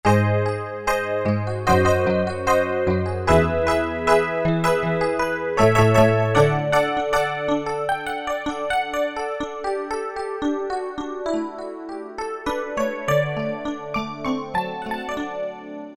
Bright EP, Roland U-220; Factory Patches

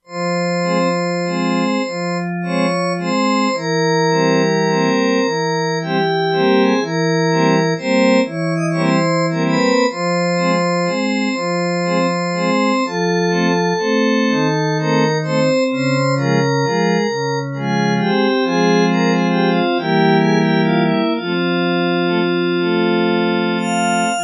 Carousel, E-mu Proteus-2; Factory Presets

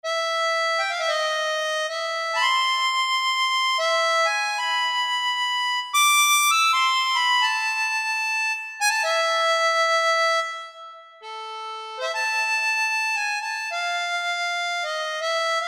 Harmonica, Roland SR-JV80-01 Pop

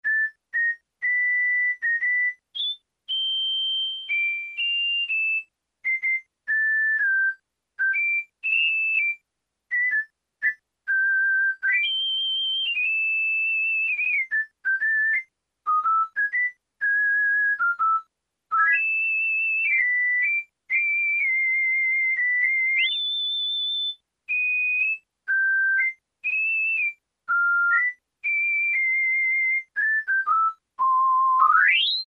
WHISTLE, Yamaha SHS-10 factory voice

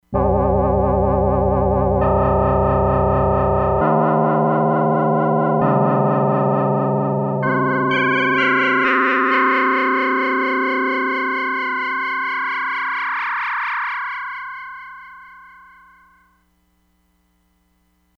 EERIE, Crumar Bit 99; Factory Programs

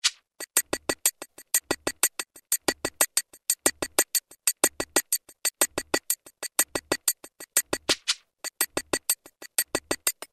loop, Kurzweil K2000; LOOPS; Kurzweil K2000 Contemporary ROM; Contemporary ROM Programs and Demos diskette

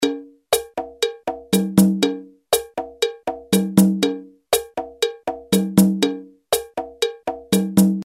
MAMBO, Roland R-8

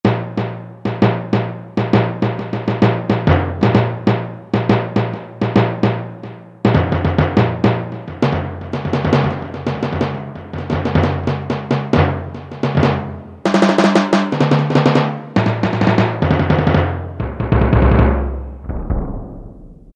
TIMPANI; Roland SN-U110-06

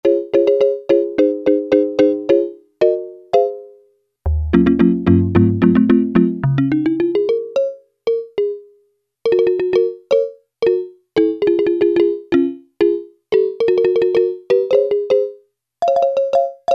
MARIMBA, Yamaha DX7

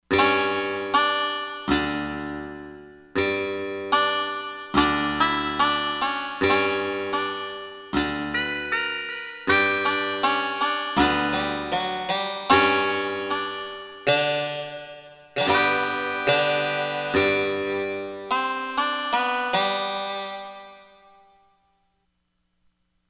Bouzouki

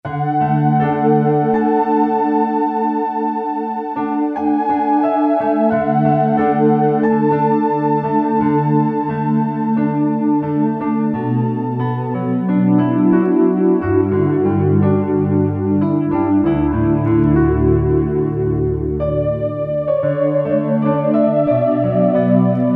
Piano + Chorus, Casio CT-370; Tones

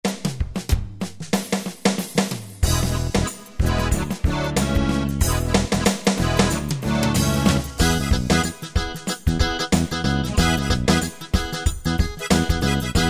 Cajun, Yamaha QY70; Preset Styles

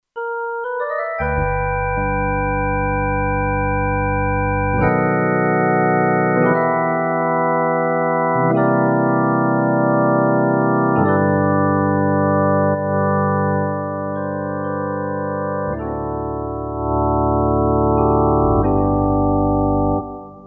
Organ; Factory Setups

